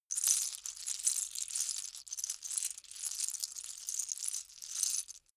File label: lego bricks small handling sequence